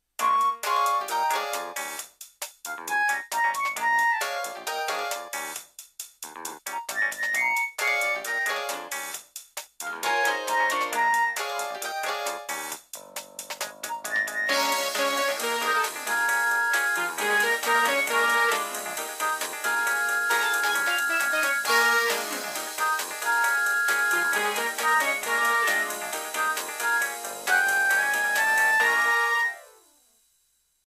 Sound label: Nokia Blue Ice